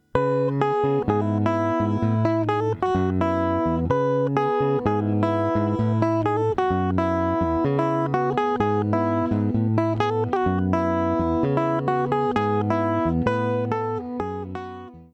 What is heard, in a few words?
main riff guitar only